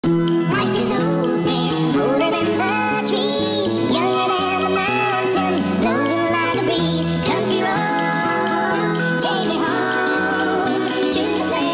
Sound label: country